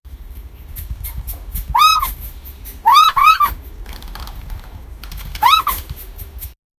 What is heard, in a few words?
panda playing with rabbit, interaction